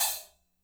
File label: HAT, MICRO KIT; Spectrasonics; Burning Grooves CD2